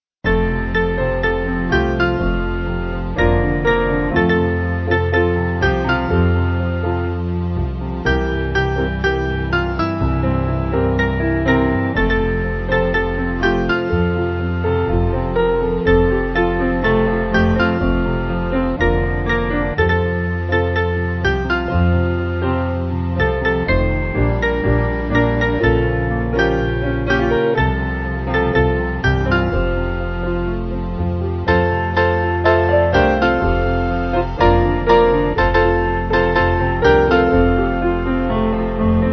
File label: Piano